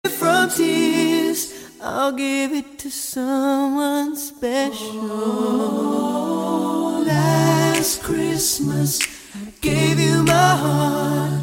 lovely song tone ringtone, christian gospel ringtones